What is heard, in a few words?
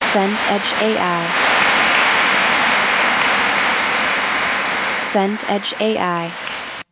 applause long